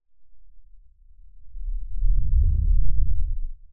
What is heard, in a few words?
Whoosh Rumble; bottlerocket